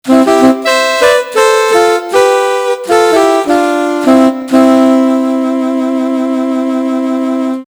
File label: Jingle Sax Harmony